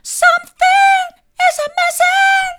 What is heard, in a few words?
mid, vocal